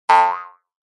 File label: Cartoon spring boing jaw harp